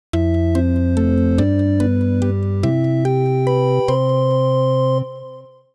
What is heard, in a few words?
bootup; ZTE Nubia RedMagic; ui